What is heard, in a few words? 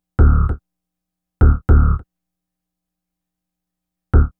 Bass Synth